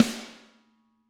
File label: Snare Hard